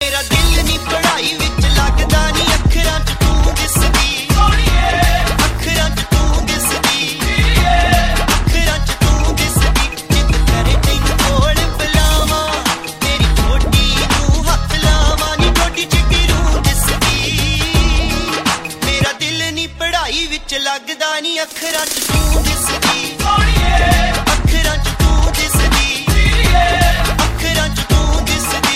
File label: best punjabi dj desi style ringtone